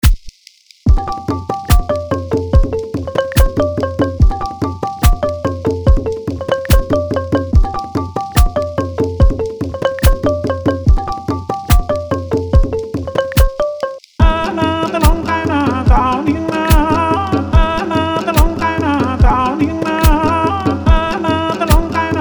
beat slow

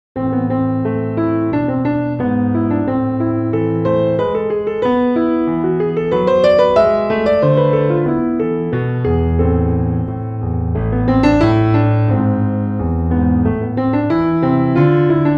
neo baroque

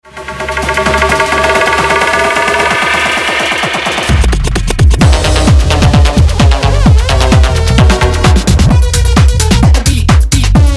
tech house; incognet samples

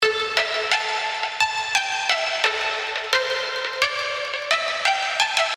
loop lead; precious

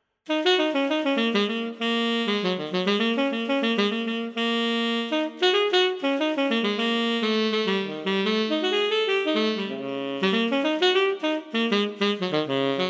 brass tenor sax

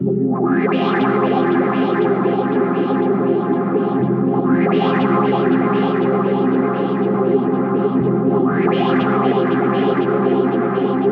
pad liquid refractions

